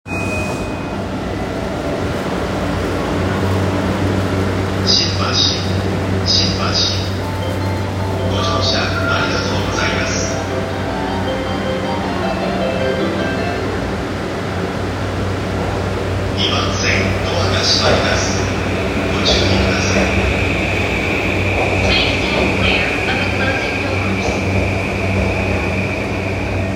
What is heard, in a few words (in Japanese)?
横須賀線 新橋 北, train sounds